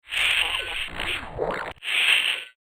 Experimental Alien Machine, science fiction sound effect; star wars / trek style games; Andrew Fleming